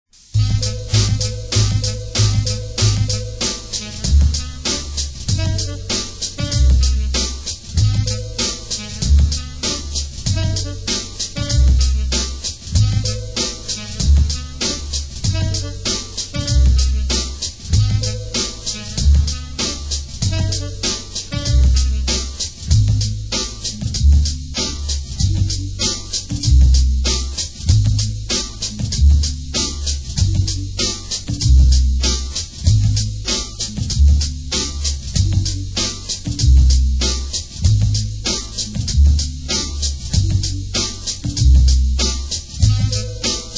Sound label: Instrumental